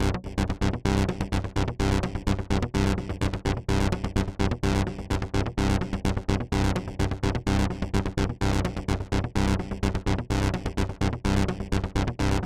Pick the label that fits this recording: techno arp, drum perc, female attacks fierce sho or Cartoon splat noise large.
techno arp